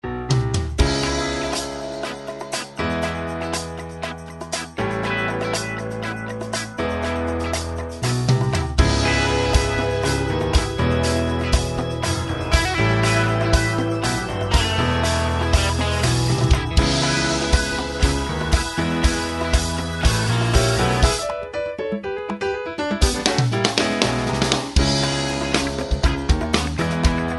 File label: rhythm blues; Background Music